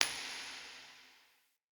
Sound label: Coin Toss Flick; Level Events; Midrath